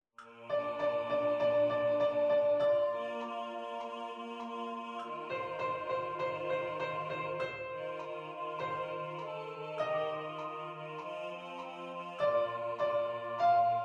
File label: Soprano Piano; Sopranes 1; Ballade en Novembre